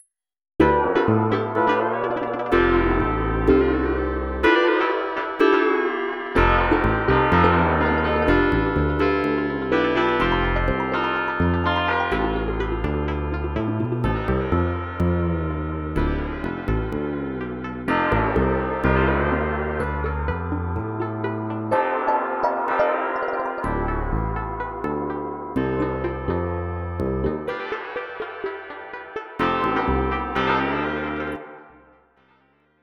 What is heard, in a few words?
drum